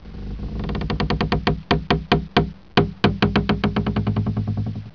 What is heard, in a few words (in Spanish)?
crujido